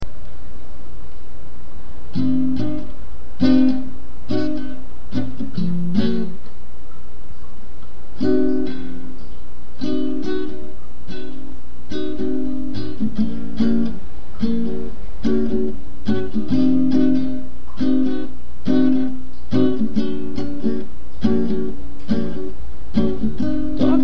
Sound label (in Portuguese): toque qualquer reggae